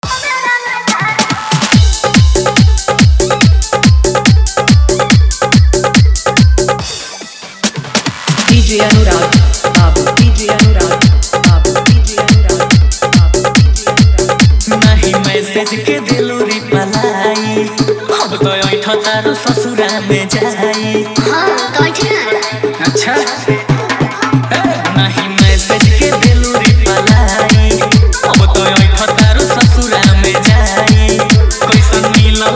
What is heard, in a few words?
New Bhojpuri Dhollk Bass Dj